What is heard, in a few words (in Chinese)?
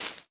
大型垂滴叶踩踏声